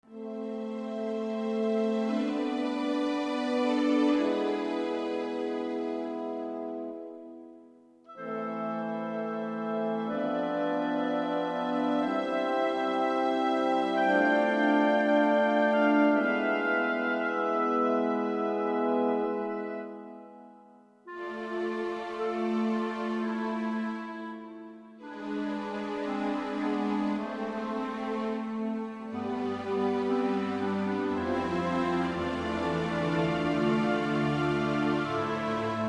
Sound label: music menu